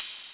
Hats Electro Open

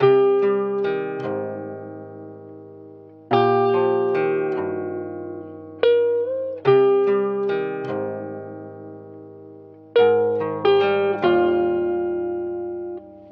guitar, Loops

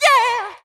"yeah", sung

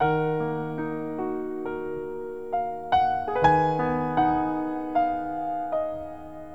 piano, Sad

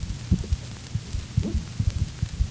line noise, Vinyl

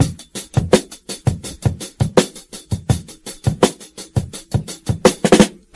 stiff and orderly, drum loops, funky

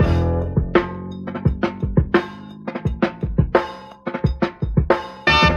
bounce back with horns, Drum loops Funky

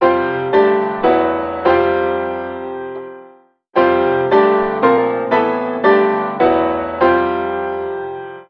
piano, Sad

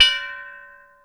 dong, Bells; Notes

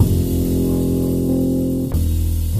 bass

